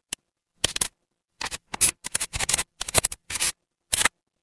office pen

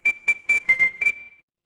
ui timer

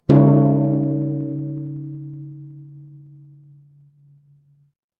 instrument gong

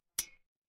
glass clink

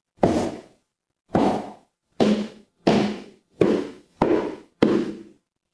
footsteps stairs

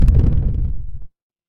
retro game explosion

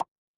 cartoon pop

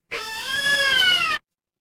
cartoon squeak

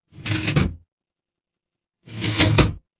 mechanical lever

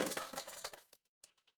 impact crash

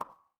ui pop

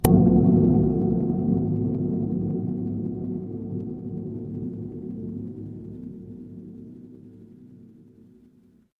horror ambience dark

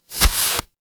paper fabric fabric

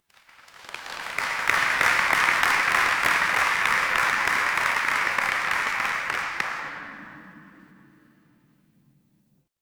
crowd applause

